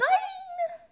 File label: boing; toadette; sm64coopdx; char select extra chars